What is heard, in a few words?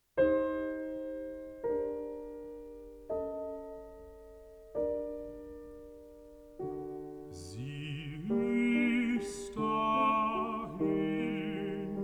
song for voice & piano